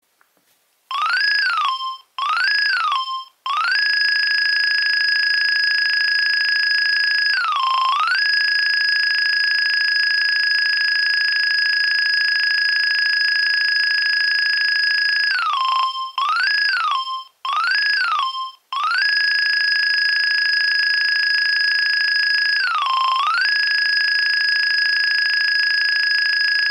Nokia 2300 Ringtones

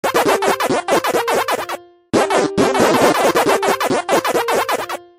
Waka Waka; Pacman; Iphone

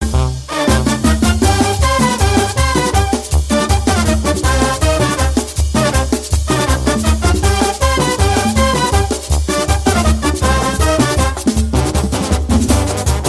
Carnaval

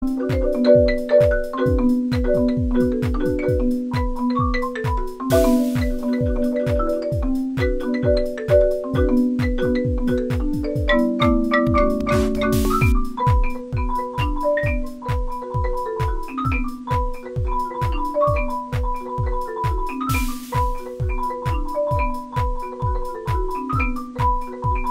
MARIMBA

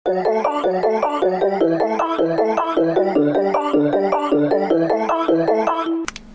Xiaomi; Pufferfish

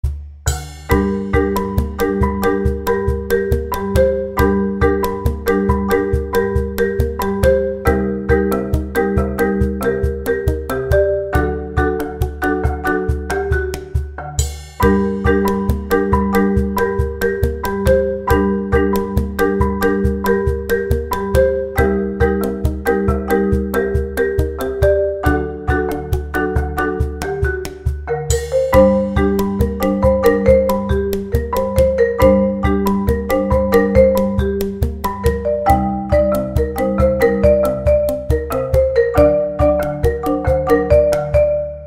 Marimba

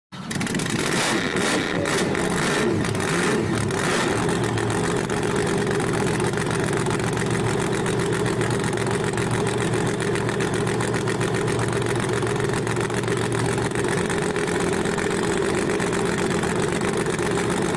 Monster Truck

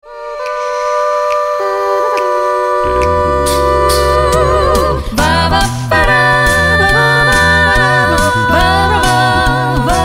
OST acapella

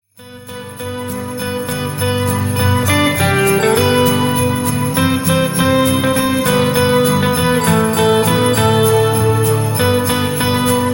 Jingle Bells Pop

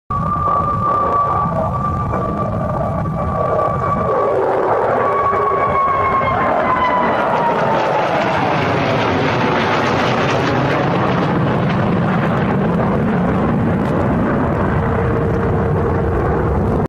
Su57 Sound

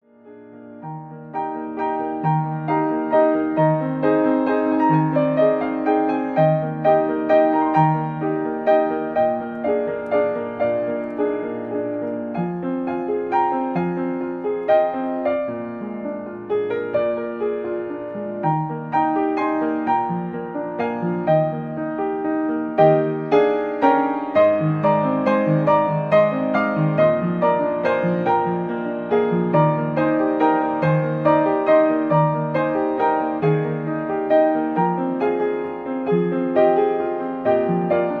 Piano

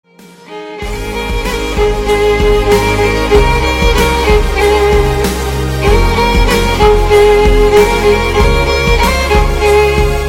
Violin

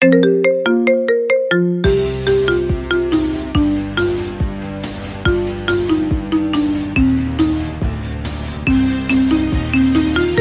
Marimba

